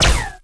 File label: rico repeater Cannon; impacts explos